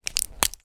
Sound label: household medicine tablet large remove pop out of foil blister pack; zapsplat